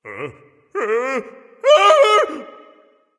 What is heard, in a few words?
fear, voice; Smite; ymir skin12